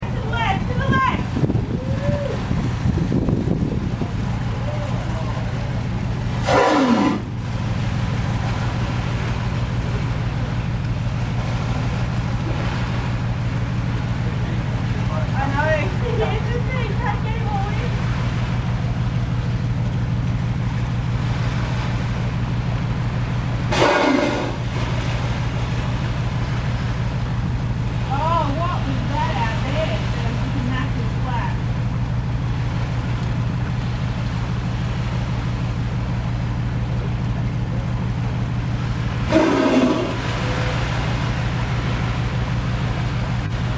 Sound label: humpback whale close to ship